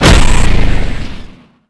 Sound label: launch; Quantum Redshift